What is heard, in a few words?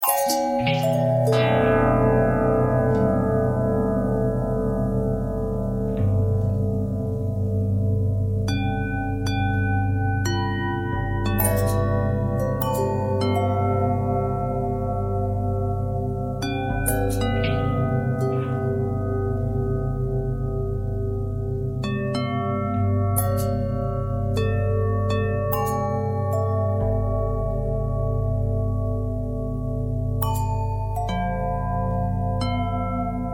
bell long bell harmonic twist